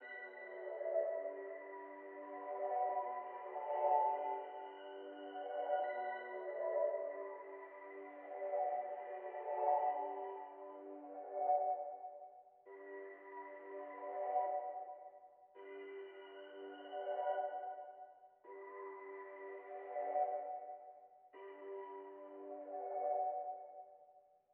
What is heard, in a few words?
166 BPM B Min High Render; Cymatics - 2022 Melody Mystery Expansion; Midnight In Seoul